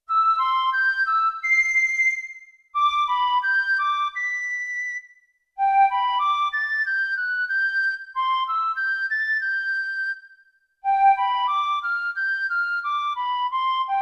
Wide Piccolo; Yamaha TX16W

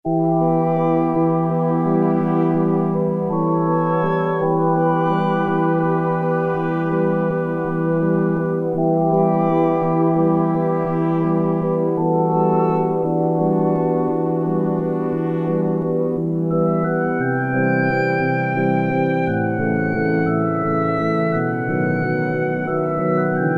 Harmo Pan, Roland MT-32

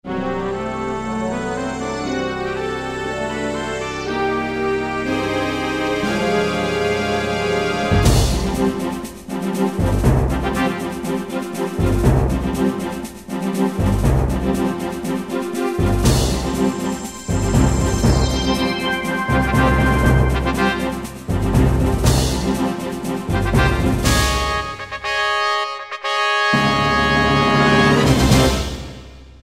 Space Opera; Preset Styles

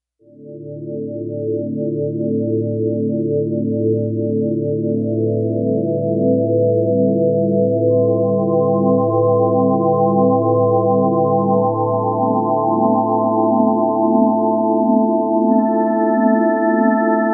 water sine; Digital Synthesizer

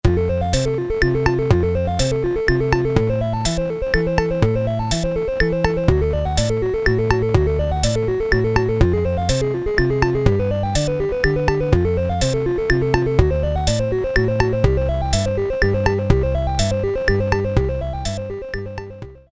waltz, Casio SK-1 Rhythm

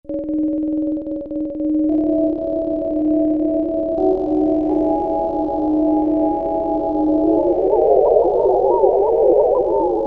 Submarin; Generalmusic Equinox

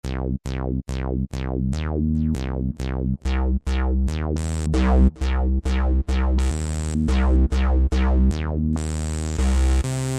V-Sw, Roland SR-JV80-11 Techno Collection

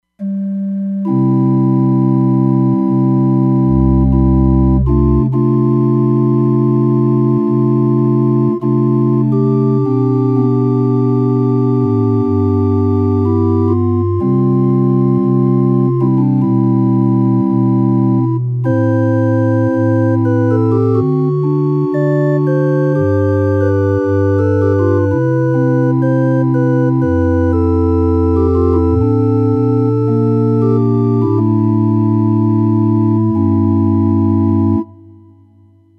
Chapel Organ, Roland Fantom XR

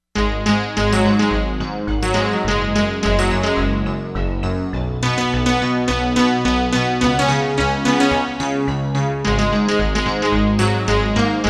Synth Forte, Korg MSC-07S Synth

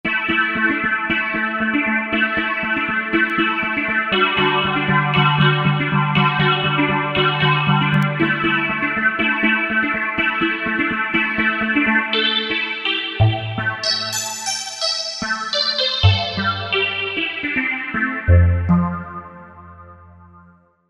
Alpha Fizz

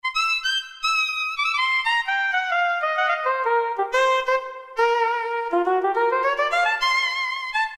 Sopranino Sax, Roland PN-D50-03

